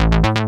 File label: 80s bass short